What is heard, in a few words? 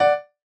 chorus chord F#6 D6